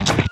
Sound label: Humanoid Ranged Physical; Kilta